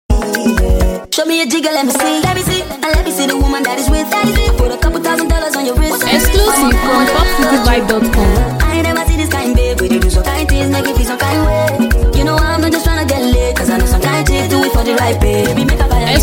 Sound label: Remix Speedup